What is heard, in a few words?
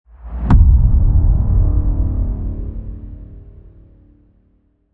Boom Brass D; Evolve